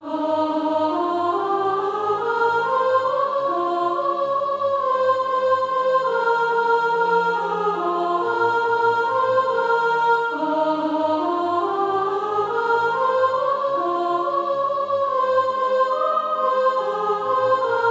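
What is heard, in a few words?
sop, Practise Music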